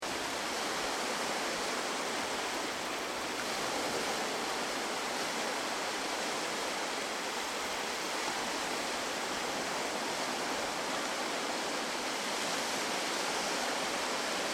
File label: Ocean Beach Sound Of The Sea Rough Sea; Nature; Ocean - Beach - Sound Of The Sea - Stormy Sea 2